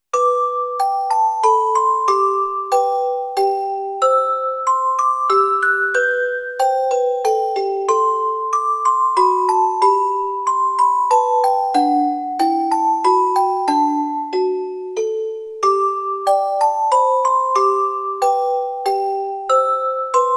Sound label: menuet; メヌエット; J.S.BACH